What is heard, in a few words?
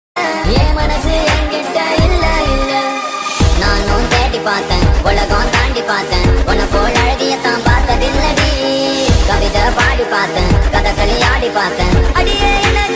sped up version ringtone